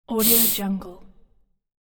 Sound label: sniff